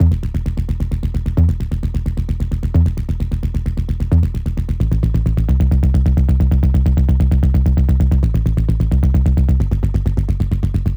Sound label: 175 E Bass